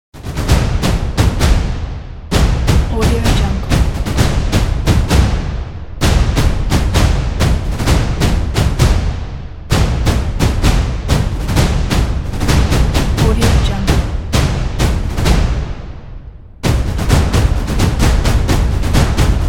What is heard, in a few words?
drums